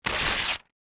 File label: paper rip